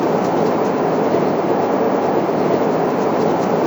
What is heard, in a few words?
road roll in, tires; Kart; AOS RF1 Kart Demo